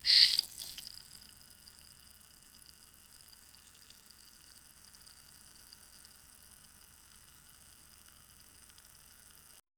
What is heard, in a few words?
a single liquid muffled, fleshy